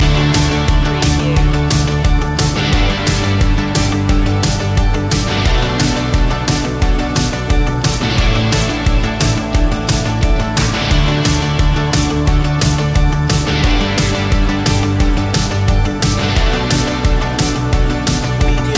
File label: take on the day loop